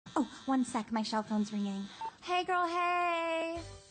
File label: Shell Phone; Lowes